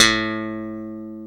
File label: A 1 slap, bass; AKAI S-Series CD-ROM Sound Library VOL-8; SET#1 BASS